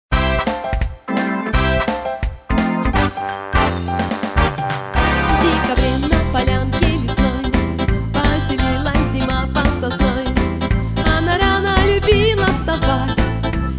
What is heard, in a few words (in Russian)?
fonogramma